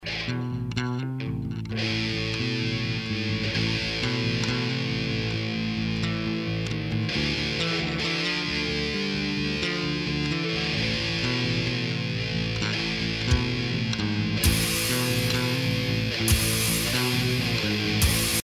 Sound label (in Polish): nowe groovy poczatek